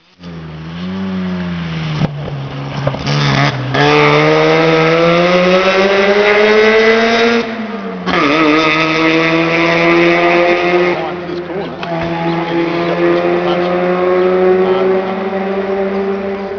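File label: rx7 acceleration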